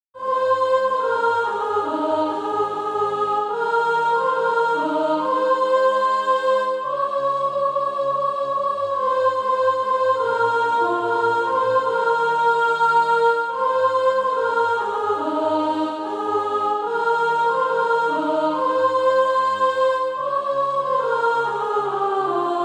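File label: soprano, Practise Music; Dare to Be a Daniel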